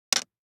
DICE on Plastic, Drop, Standard, 4 Four Dice; Samples; ArticulatedSounds; DICE Sfx Party (Extended)

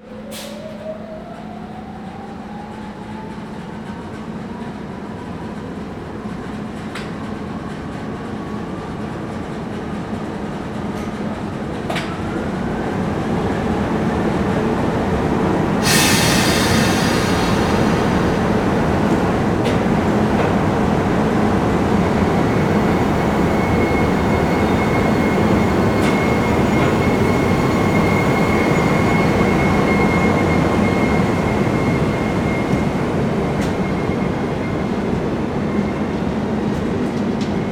train starts rolling; best service Blue Box

